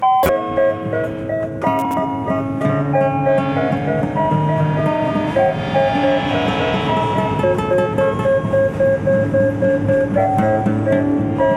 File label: improvisation performance